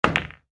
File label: ball in hole, pool; pool8ballmania